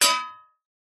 impacts metal solid plate disc hit vibrate resonate